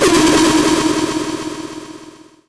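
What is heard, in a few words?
weapon gatling laser; PC Gamer IT CD 47; Centauri; alien-x-fire-demo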